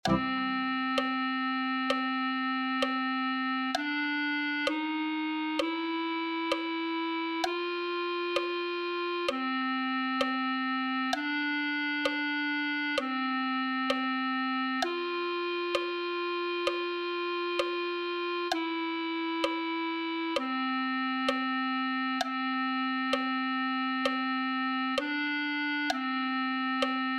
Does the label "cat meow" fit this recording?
No